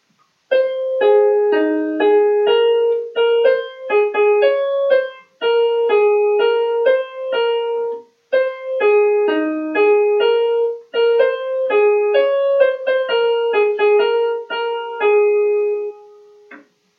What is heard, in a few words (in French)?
opera Cendrillon chant donnez moi la main instr